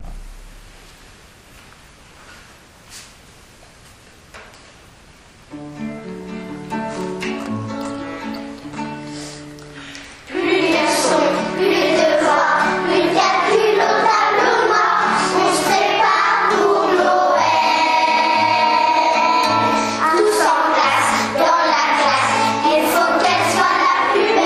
On s'prépare pour Noël

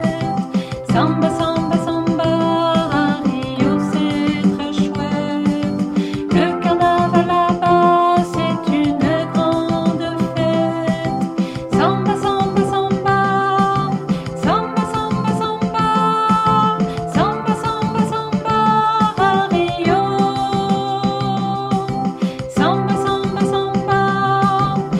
extrait chant

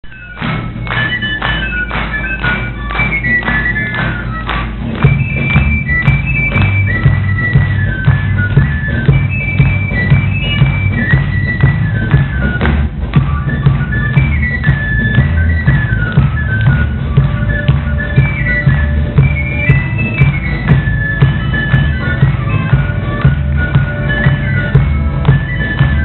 farandole de Tarascon

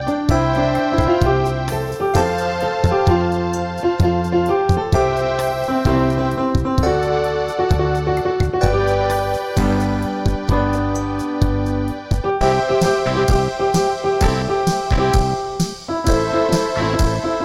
extrait instrumental

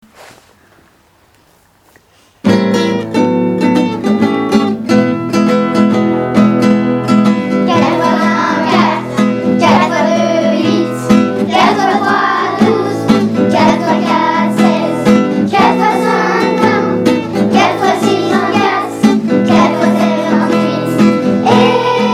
Je chante la table de 4

